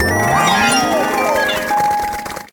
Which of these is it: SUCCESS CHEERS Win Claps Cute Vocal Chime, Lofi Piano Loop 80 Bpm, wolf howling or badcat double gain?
SUCCESS CHEERS Win Claps Cute Vocal Chime